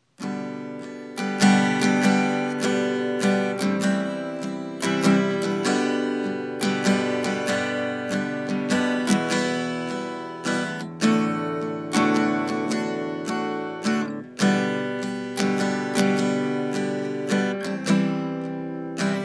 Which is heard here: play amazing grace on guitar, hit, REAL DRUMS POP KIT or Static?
play amazing grace on guitar